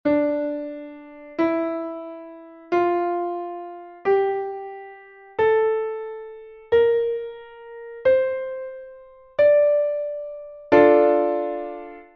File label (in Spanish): escala re menor